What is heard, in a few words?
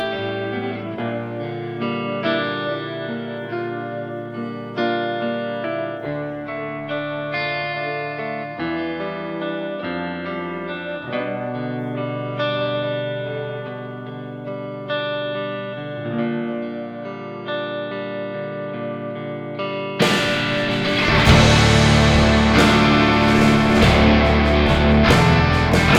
instrumental